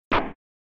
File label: SNES Soccer Shoulder Charge